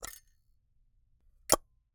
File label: Camera, Argus, aluminum, steel, professional, fully mechanical, film compartment, open close; Eiravaein Works; Vaeyan IV